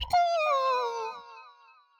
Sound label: ghost chicken cackling